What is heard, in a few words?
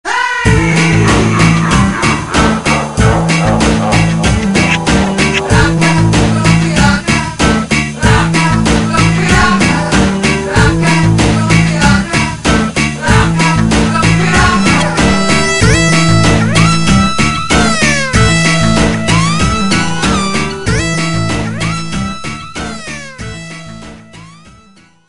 Piraka Song; Bionicle Draw A Piraka v1